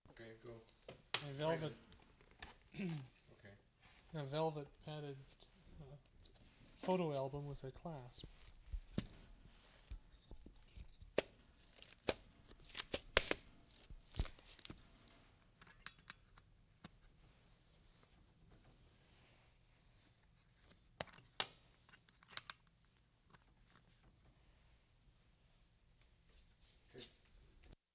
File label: VELVET PHOTO ALBUM, can't hear much